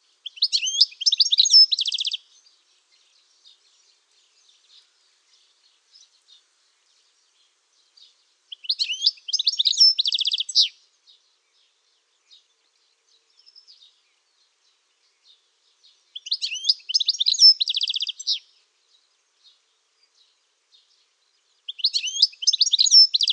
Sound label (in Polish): SZCZYGIEL, birdsounds